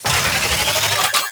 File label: Excavator Drill Power Full; Encounters